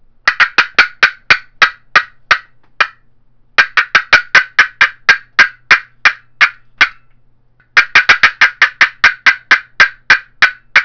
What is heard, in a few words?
painted poplar maple boat paddle cutts, turkey; Southland game calls